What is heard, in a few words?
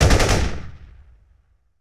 AK-47 Short Burst; Weapons & Firearms; Sony Pictures Sound Effects Series Volumes 1-10; Sony Creative Software